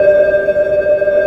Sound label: SONAR, SF EFFECTS; Q Up Arts; Dream Experience